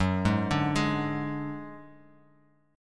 F sharp-Suspended 2nd Sharp 5th-F sharp, Bass Standard, F#sus2#5 chord; arpeggiated; JGuitar